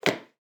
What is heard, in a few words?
VHS Handling; SoundsCrate